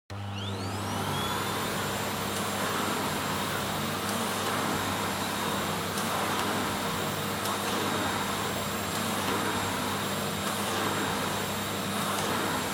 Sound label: Vacuum Cleaner Vacuum Floor Soft Mid; 掃除機; バキューム 床 ソフト 中